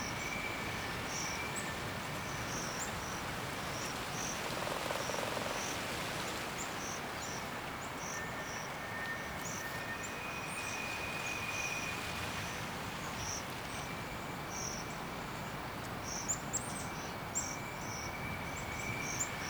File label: Day Ambience Loop, Glades; Glades Of Andurin; Beds